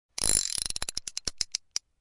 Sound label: gun spin; RussianRoulette; PainJam